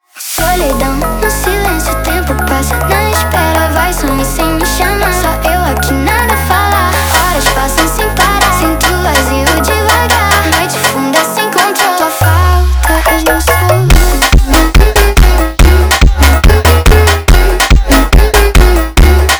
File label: sped up, funk